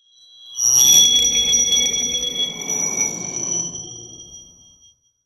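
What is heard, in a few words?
medieval fantasy satisfying, crafting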